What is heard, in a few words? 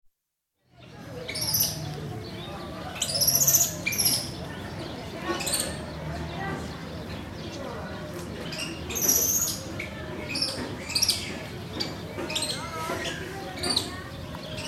Spain: Small zoo & aviary in recreational area, with lots of noise from monkeys when teased, dog barks occasionally & traffic; BBC